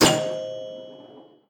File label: bumper, pinball